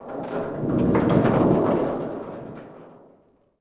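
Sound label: creak metal large; Star Wars Obi Wan; XBOX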